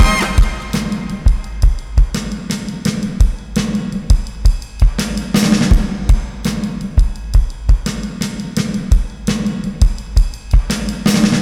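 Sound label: drum, 084bpm, Breakbeat; Mr Davis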